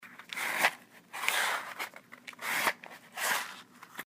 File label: matches